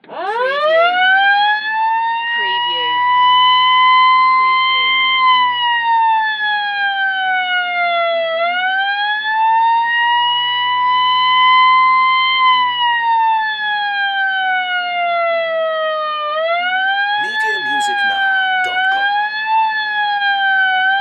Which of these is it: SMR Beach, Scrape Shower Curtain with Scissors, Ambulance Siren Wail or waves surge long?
Ambulance Siren Wail